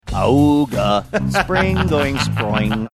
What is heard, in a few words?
ahooga spring going sproing